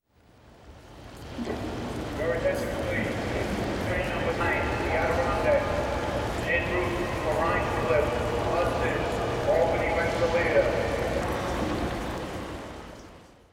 soundscape station

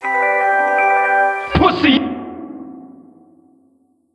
speaker tickets, ambience

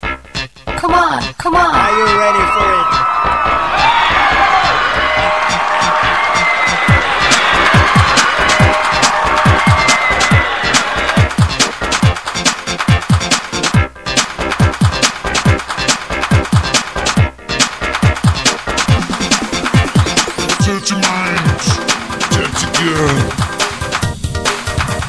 radio

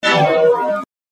alarm

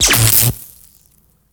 ambience disaster big spark

